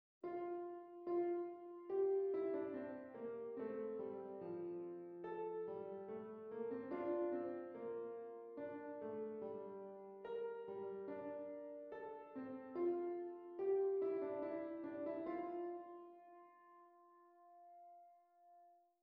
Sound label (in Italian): Esercizi di contrappunto a due voci; dritte Gattung; Ronge pagina 60